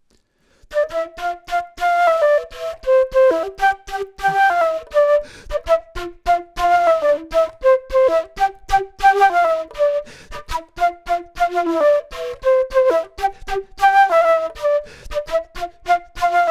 flute; logic

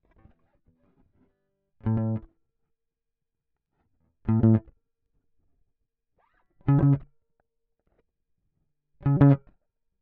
Small Bright Combo

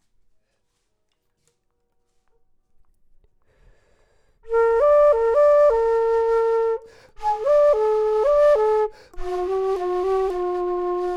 flute low; logic

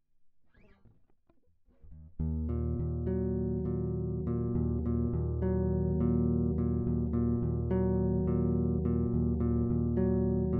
Multi-Phase Amp; logic

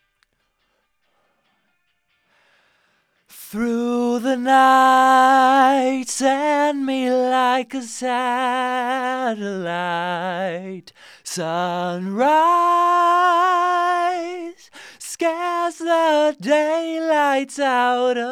vox; logic

